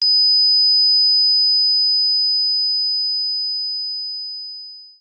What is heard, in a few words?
pump end; casualtiesunknown